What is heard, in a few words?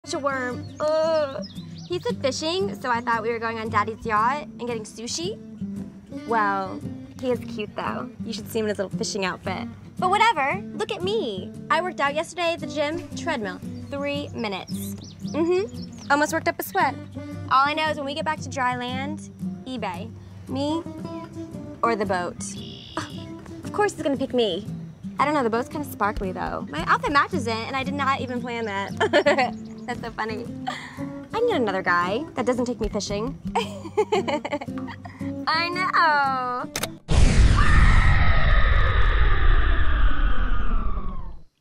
scream, stock scream; sonic exe scream in ad not origin but probably just stock scream